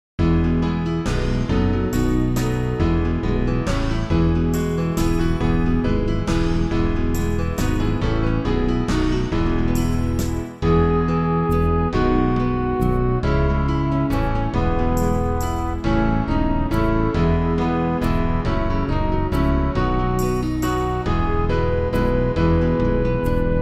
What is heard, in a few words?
instrumental; New Hope Music